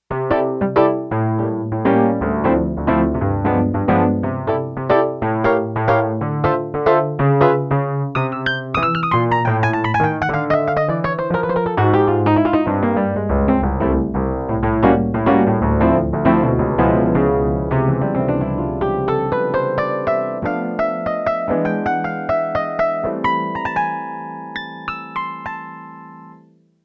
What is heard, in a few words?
Piano 2, Yamaha TX802; Factory Patches; Voices